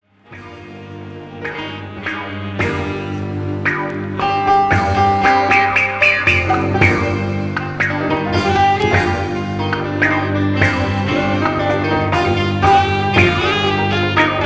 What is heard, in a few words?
oriental wind